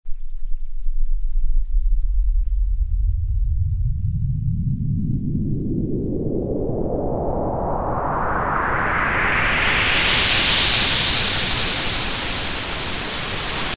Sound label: Pink Noise Effix Delayed; Techno